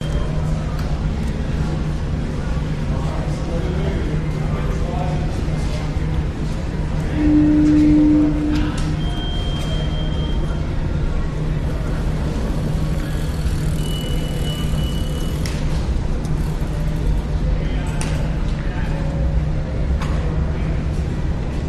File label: seabus station walla beeping steps walking people ambience; vancouver lonsdale quay; buzzing roaring; your free sounds